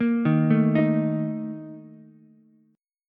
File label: A sharp Major 7th Suspended 4th, Ukulele; arpeggiated; JGuitar